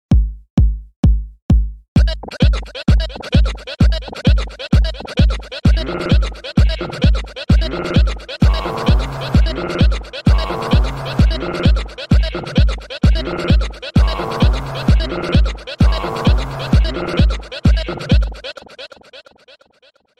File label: lego dialing